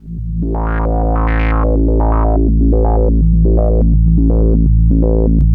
ARP 2600, Vintage Keyboards

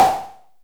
noise fx; Masterbits; Switch FX

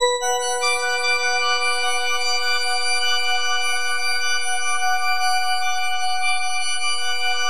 FM B MIN; Bolder Sounds

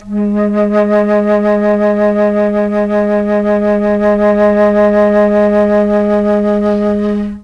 G#1 CH FLT, Mellotron, CHMBLN FLUTE; Mike Pinder - Mellotron Archive CD

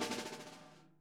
SNARE, Interactive Drum Kits

